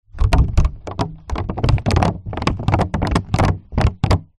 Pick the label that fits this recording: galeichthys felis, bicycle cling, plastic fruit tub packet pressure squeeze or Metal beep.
plastic fruit tub packet pressure squeeze